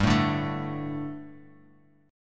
G Suspended 2nd G, down; Gsus2 strummed; JGuitar

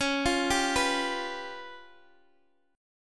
Db 7th Flat 5th, Guitar, Open G

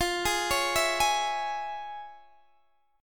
F Minor Major 7th Sharp 5th, F, Guitar, Open D

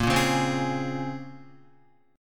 Bb Minor 9th Bb, Guitar; Bbm9; strummed; 5,1,1,5,1,4; JGuitar

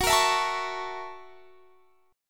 F sharp 7th Sharp 9th

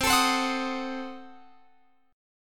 C Diminished 7th C, Guitar Open F; Cdim7 strummed

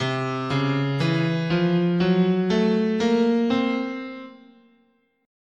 C Oriental, scale

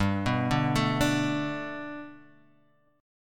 B Minor 7th G, chord; Bm7/G; arpeggiated; JGuitar

